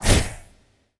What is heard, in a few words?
sfx skill, ZombieSkill SFX; IdleRPG2024